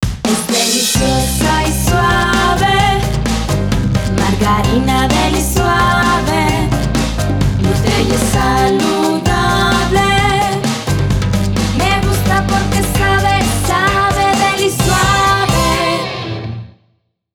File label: Short Jingle